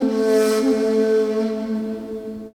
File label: SIERRA FLU; Best Service Advanced Media Trax Building Blocks